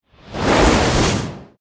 Magic Spell Pass By, Horror, Magic, Spell, Pass, By, Whoosh